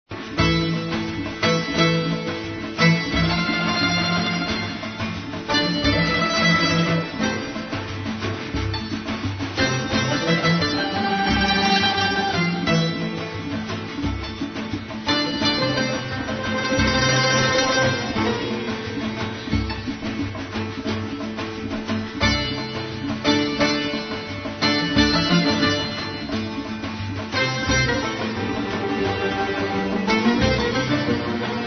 music tar azari

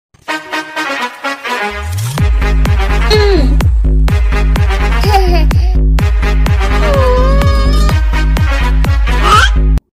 emoji baby song

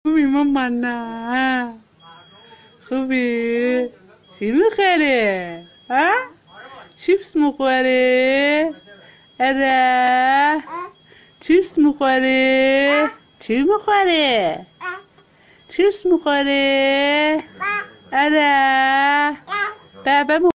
new music tanze khandedar remix

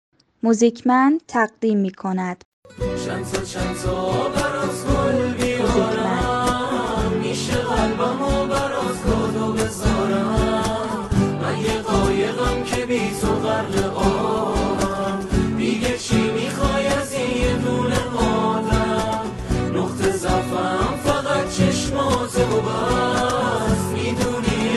music hamkhani gorohi

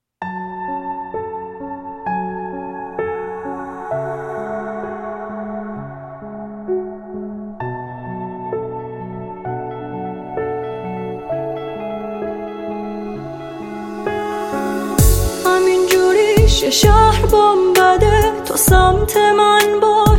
car music

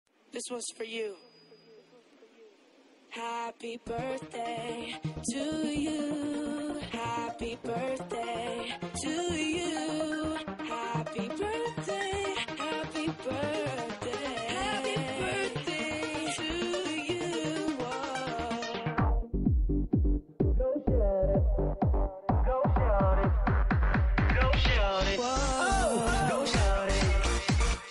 happy birthday remix

